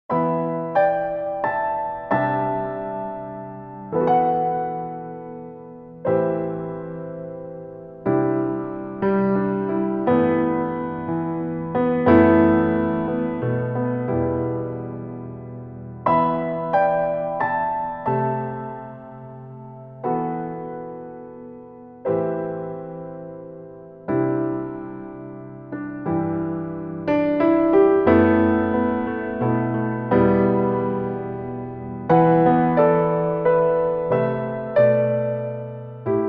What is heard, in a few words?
piano version